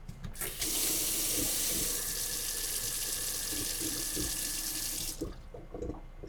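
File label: suidou, water tap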